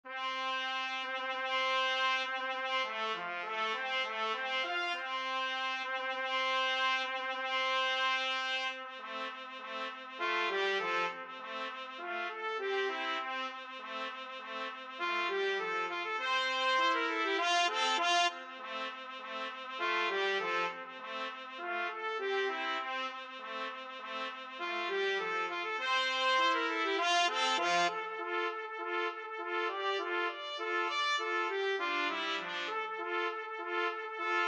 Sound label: william tell trumpet duet